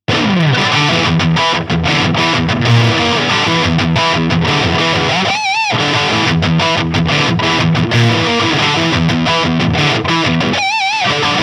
Sun Valley Super Shredder Exotic FR Ziricote High Gain